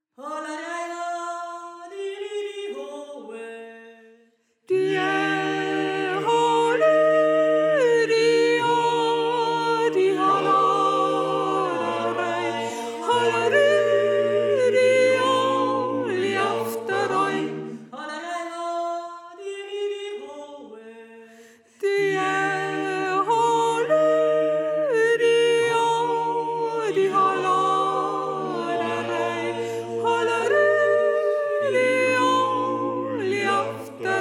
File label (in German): Steirer Sopran